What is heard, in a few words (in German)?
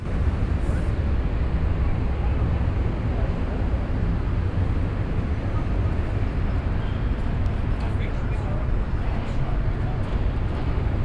sp von oben, Brasilien; Fieldrecordings